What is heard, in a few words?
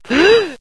breath; chronos